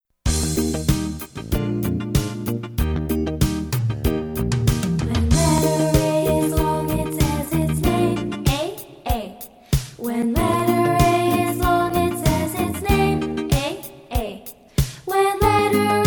Long Letter A Phonics Song

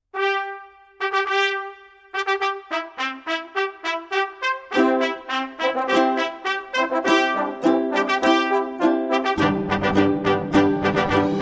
Rossinis Ukulele Overture; Classical Ukuleles A Collection of Favorites for Young Players

